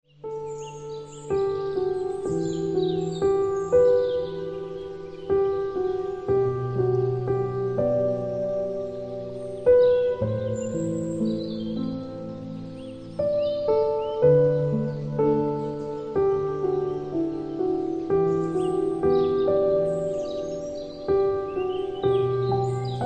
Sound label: Ringtone Piano Music